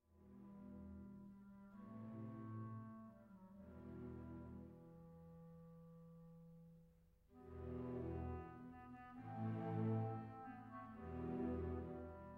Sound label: Symphony in E Minor, Andante - Allegro Con Anima